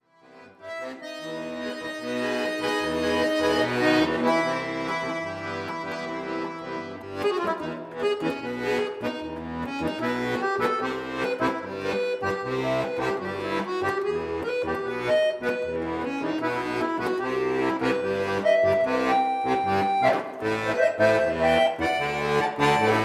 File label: accordion